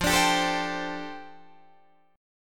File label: F 6th Add 9th F down Guitar Open D, chord